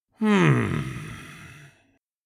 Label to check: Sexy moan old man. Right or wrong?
Right